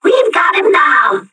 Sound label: player hurt, metropolice; npc